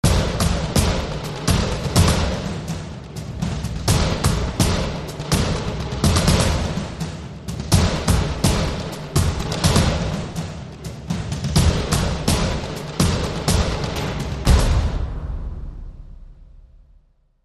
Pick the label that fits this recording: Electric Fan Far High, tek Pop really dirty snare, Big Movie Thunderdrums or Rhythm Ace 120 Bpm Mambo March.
Big Movie Thunderdrums